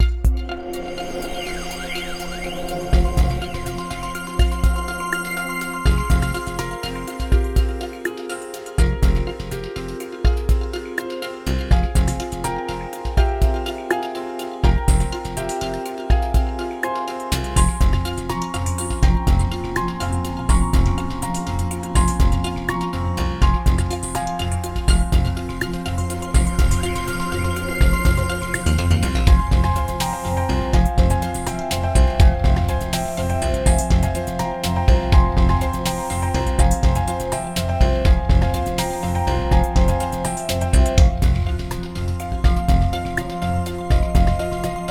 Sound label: mood matrix (moderato)